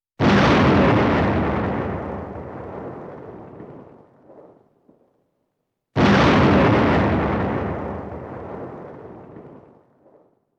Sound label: Heavy Artillery Shots